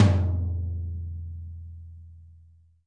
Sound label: thin tom, Multisampled Real Kit